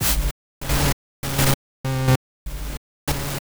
Rythmic Noise 130BPM

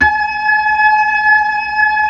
B-3; Keyboards of The 60's and 70's

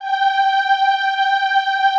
EHH G4, Classical Choir; Best Service; Extended Classical Choir; EHH MIX

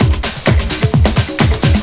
break in the conga; Zero-G Deep House; 130bpm

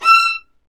String Sections, Violas Marcato; Roland LCDP13; STR Vas1 Marcato

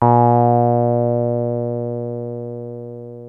303 A2, TB 303

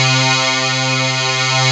SYN JD8000, Roland

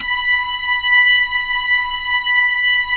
B-3 Jazz, keyboards; Keyboards of The 60's and 70's

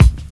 KIT, Dance Drums; Best Service

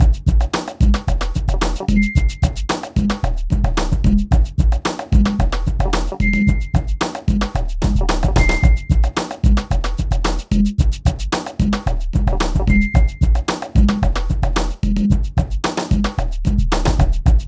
BEAT, Beats 105-150bpm

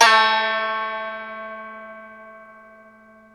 SU GZHENG, Earth Tones

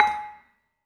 LAMELS G#4, Asian Traditions; USB Soundscan vol.60; AKAI; LAMELOFO

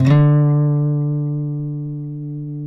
FINGER, Guitars; TAKA

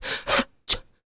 FEM PERC, Vocal Jazz FX

